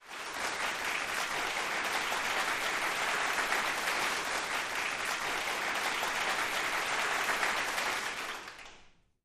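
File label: Crowd 100 Begin End, medium interior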